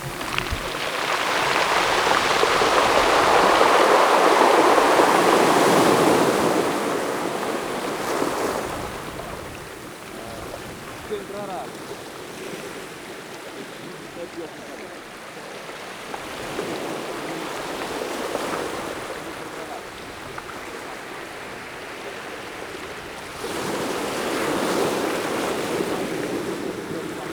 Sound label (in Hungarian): vízben görgő kövek emberek